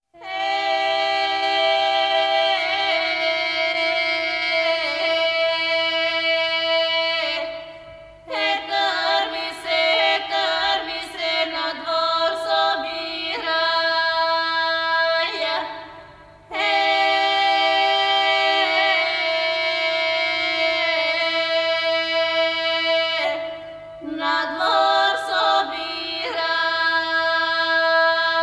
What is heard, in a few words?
Diaphonic Chant, Female Vocal Choir, Bulgarian; Schopska Pesen